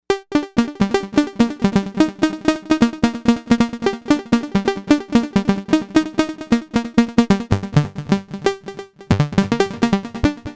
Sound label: Electric Stab, Novation UltraNova; Factory Patches